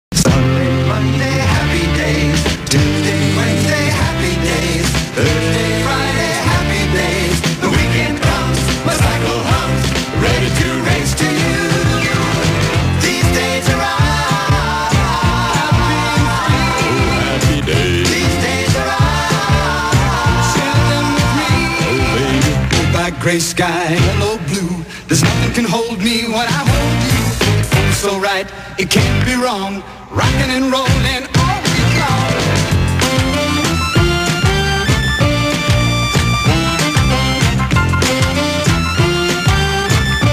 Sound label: Karaoké